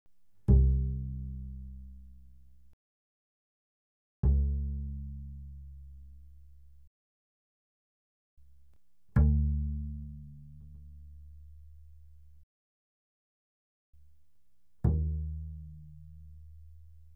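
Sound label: Bass pizzicato fortissimo sul A C2 B2, doublebass; Strings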